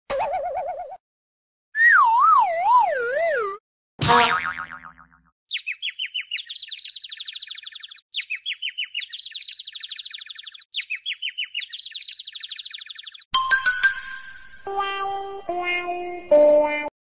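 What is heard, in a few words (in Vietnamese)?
Các âm thanh choáng váng bị đánh vào đầu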